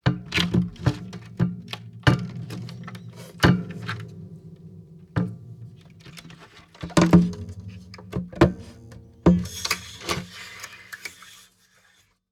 ZITHER General Movement; Twisted Zither

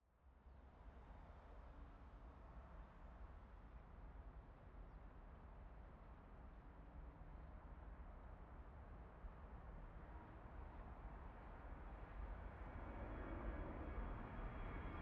Planes Takeoff; aXLsound; Planes - Takeoffs and Landings

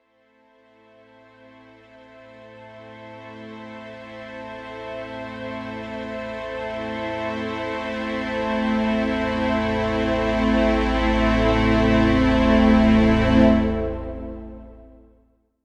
MUSICAL SHORT String and Pad Rise; Mavic Travel Filmmaker; SmartSoundFX

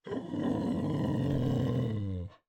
growling, gutteral, turning toward, exhale; Epona; Eiravaein Works

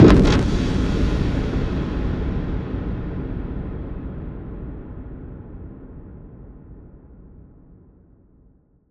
black project cinematic impact; Black Project - Mysterious Cinematic Samples; Bluezone

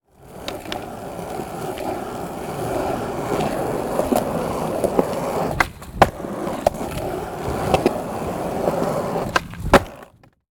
Skateboard, POV, Skate, Jump, Land, Fail; Skate on Board; Rzpost

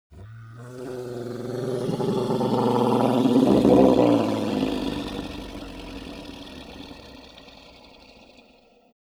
HELLHOUND Idle Weak, Monsters; SmartSoundFX

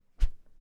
gore - metal knife swing; Gorification

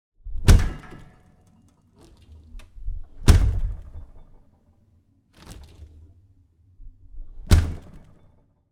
Refrigerator - Closing the Door, Kitchen Sounds; Sound Ex Machina

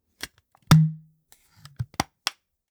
Plastic Bottle, Open & Close; Open & Close 2; SoundBits

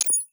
USER INTERFACE SIMPLE, SCI-FI; Foundation Series SCI-FI vol 2; PMSFX

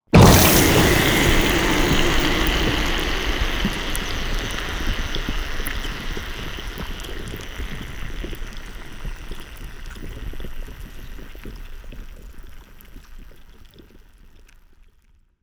Underwater Designed Acid Soak Hard, Submersion; Spectravelers

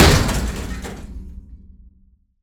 metal element, Industrial; Dark Mesa Industrial Ambiences and SFX; BlueZone

